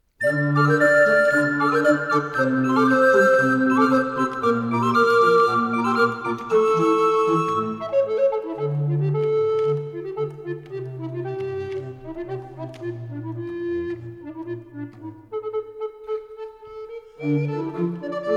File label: flaut tango